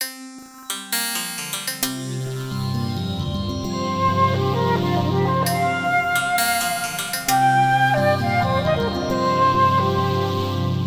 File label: movements for flute and electronics; III. always with some forward motion